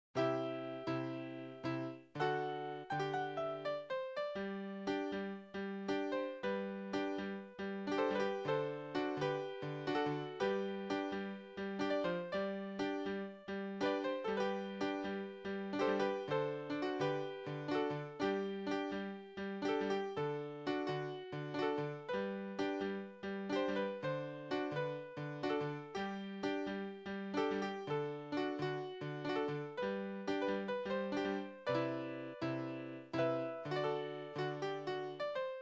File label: piano